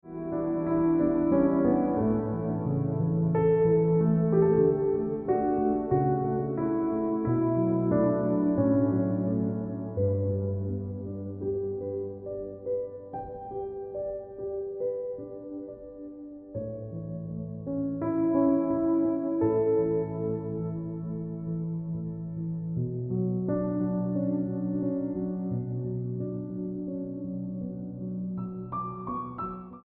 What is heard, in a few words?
Ringtone Piano Music